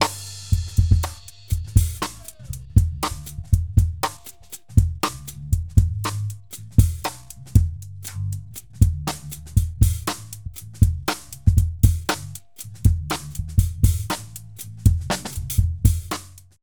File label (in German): Einzelspuren BD SN OH Electro Clear Bus